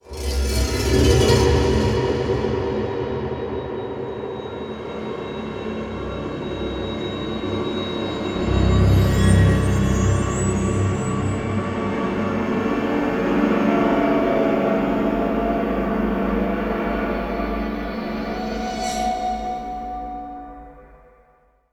Mysterious Stinger; rise of nightmares xbox 360 gamerip 2011

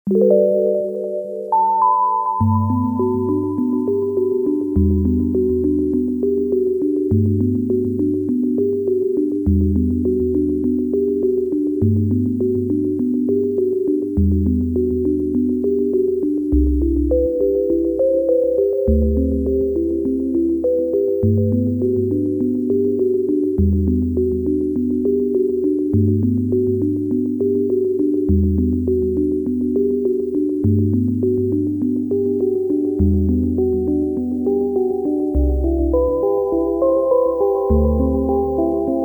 Intro Sine; Epitasis

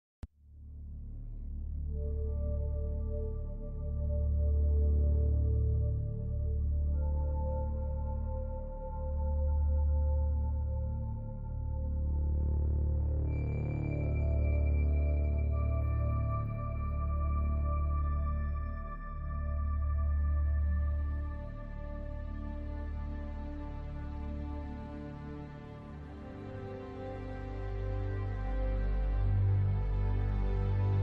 Ship Ambience Theme

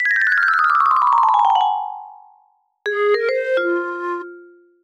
(Jingle) Fail; Mario vs. Donkey Kong

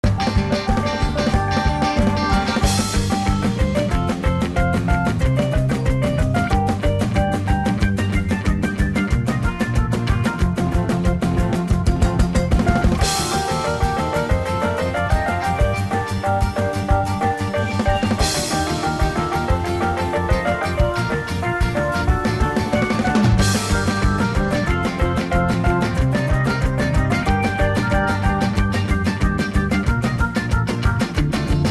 Race

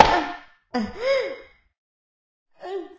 peeing poke small Music; Tom

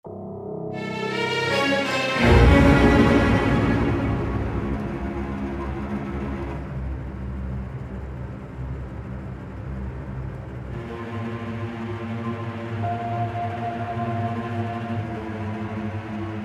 Knight Dramatic Fight; Inmost

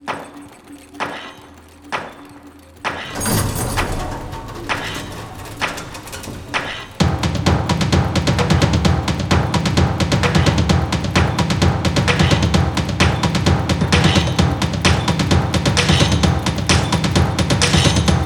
Industrial Intro; Industrial Strength Dodgeballs Intro; metagalactic blitz

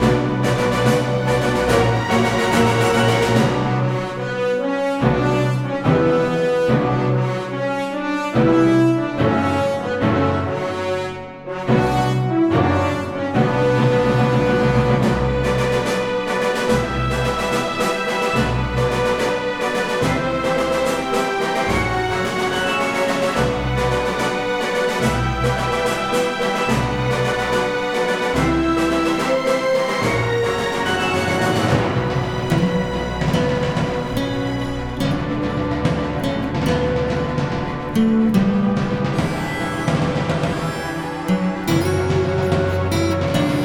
sound music prison

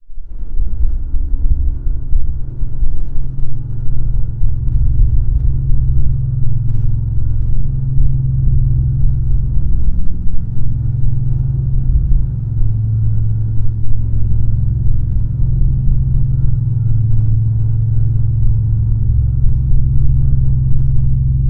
Drone; Puzzle Quest: Galactrix; PS3, Windows, Xbox 360

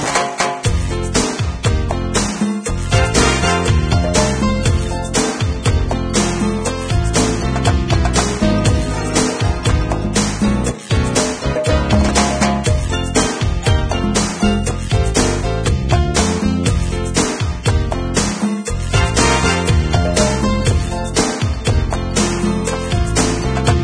Menu Music; tiger woods pga tour golf ps1 gamerip 2000

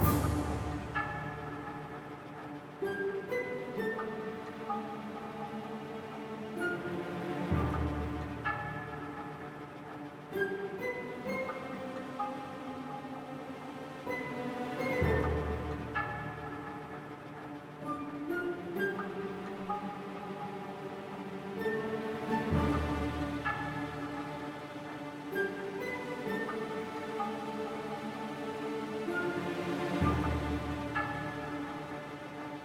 Music Wait Theme Bpm128 Loop; asterix obelix slap them all 2

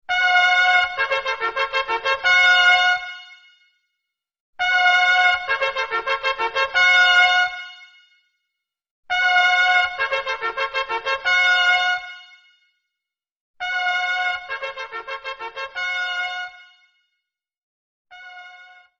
Trumpet; The Cursed Crusade; Xbox 360

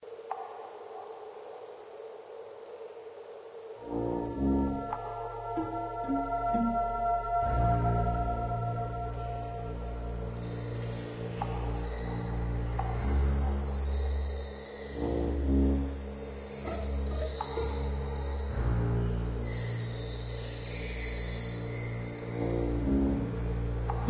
Cave Instrumental Amb; Shieldwall Chronicles: Swords of the North

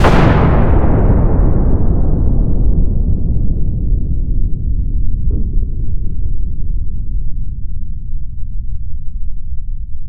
Ship Explosion; gamerip; IntroShip Explosion; Star Shelter

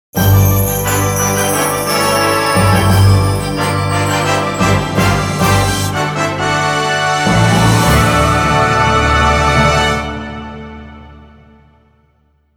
Complete; culdcept revolt original soundtrack dynamix 2017

